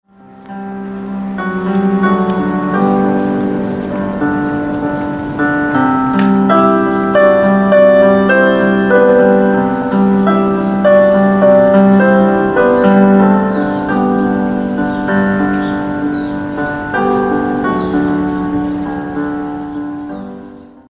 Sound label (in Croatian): ELEKTRICNI KLAVIR